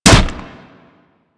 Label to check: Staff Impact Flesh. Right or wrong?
Right